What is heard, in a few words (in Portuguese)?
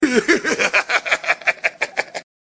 risos